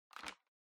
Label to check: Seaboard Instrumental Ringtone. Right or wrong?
Wrong